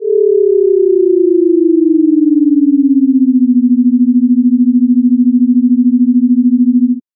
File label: Sweep